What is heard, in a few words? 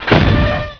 Vehicle Speedboat Engine Hit; Playable Tutorial Level; TR2